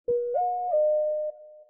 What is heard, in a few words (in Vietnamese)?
tin nhan oneplus ace 3 sms